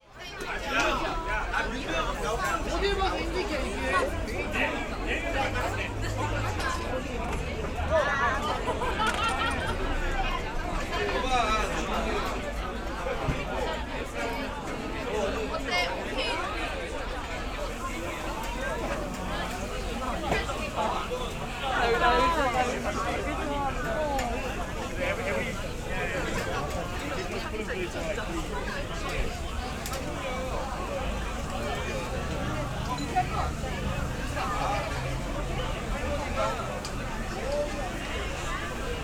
Ambience, Restaurant; City Sounds; M8

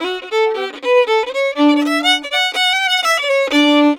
120 D violin, Celtic Flavours

